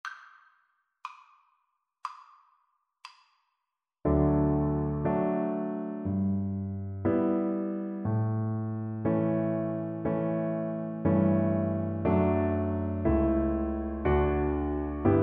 romance, cello

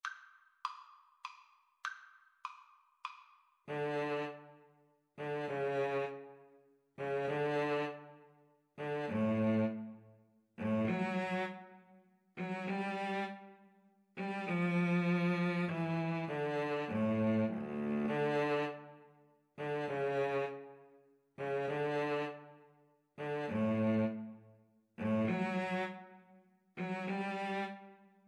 Les Coucous Benevoles, Violin-Cello Duet

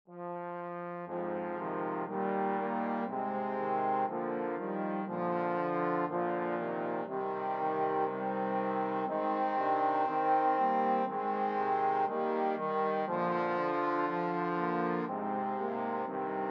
trombone quartet

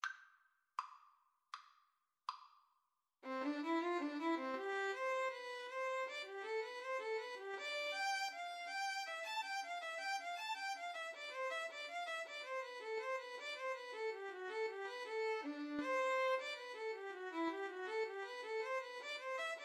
Violin Cello Duet; bach invention 1 bwv 772

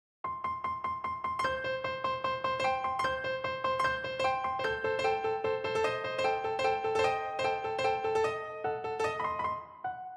poules, piano

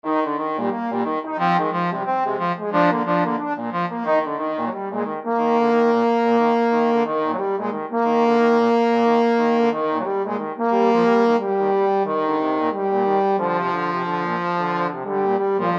when the saints, trombone duet

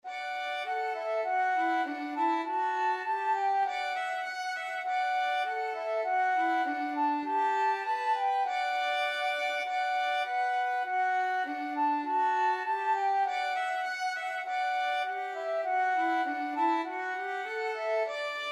flute violin duet; Angels We Have Heard on High

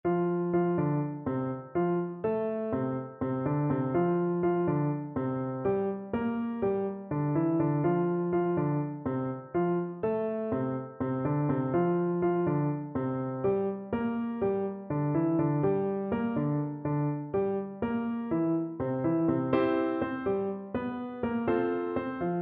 jingle bells salsa

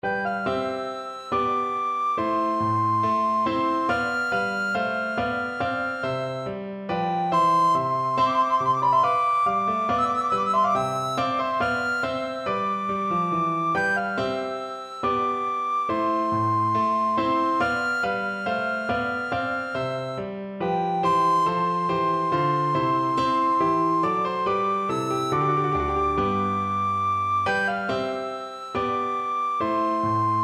bourree, recorder; handel

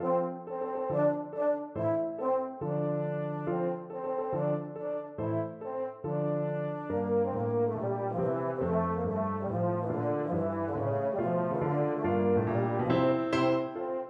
baritone, baritone horn

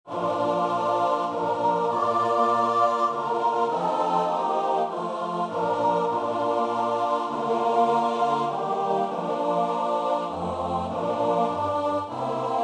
in the cross of christ CHOIR

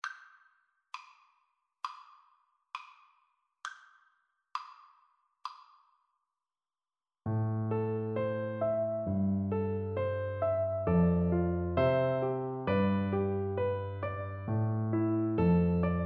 the foggy dew, Flute

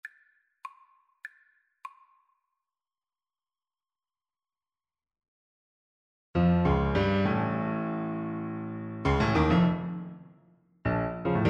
euphonium

